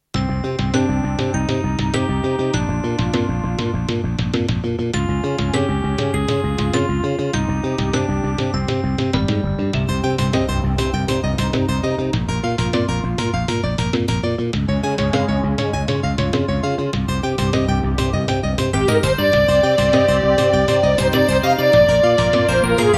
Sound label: Creative Sound Blaster AWE32; Hocus Pocus